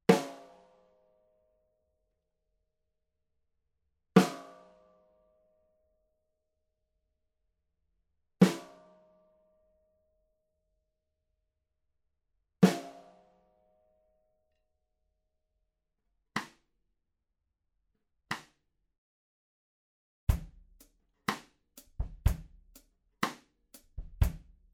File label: Mixdown Snare